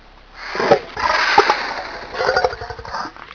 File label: breathe; svencoop; gruntsparty; garg